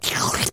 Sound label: eat